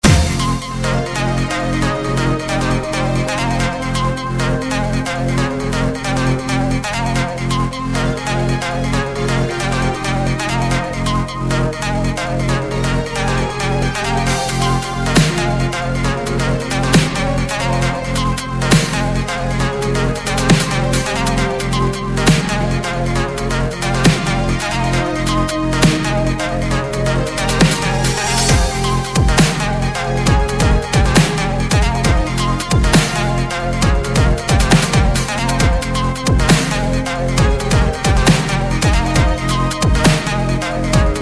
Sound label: dubstep mix